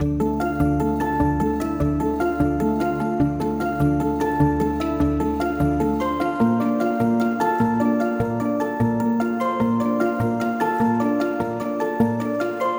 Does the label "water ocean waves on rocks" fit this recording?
No